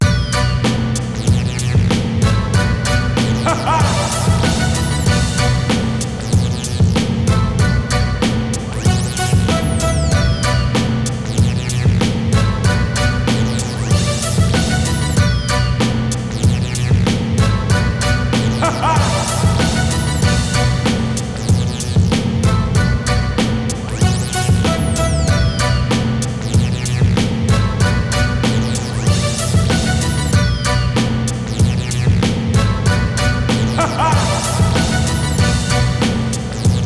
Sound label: Beat; NBA Live 2003; PlayStation 2